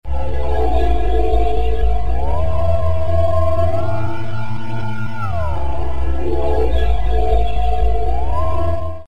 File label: chorus looped